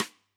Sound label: K1 close SdSt, drum